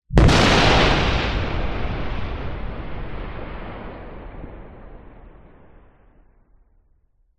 thunder crack designed; nature

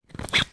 office glue stick lid put on; sound effects one

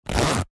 clothing bum bag belt zipper zip; zapsplat

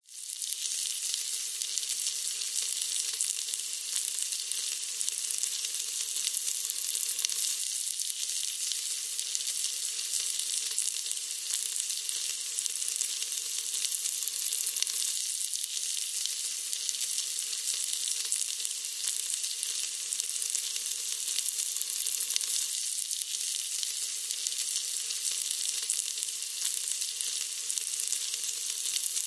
food leek shallow frying in pan; zapsplat

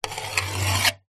foley metal scrape sharp object rusty old thin

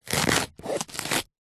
leek raw cut slice knife single; food

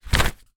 paper notepad page turn; foley; zapsplat

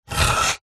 office pen biro draw line on wood

